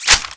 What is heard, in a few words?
smg clip in, weapons